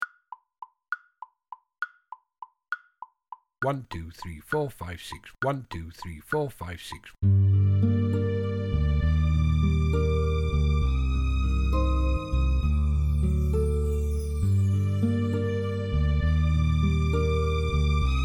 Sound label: Backing Quavers, drum kit; Drifting